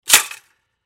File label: Toaster Up, Toaster Up Mechanism Spring Latch; Foley Appliance; Household; Appliances; Blastwave